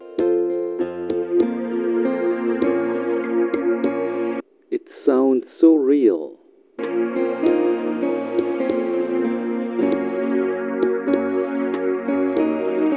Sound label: Poor LP